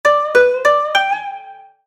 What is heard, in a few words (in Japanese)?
場面切り替え 琴 和風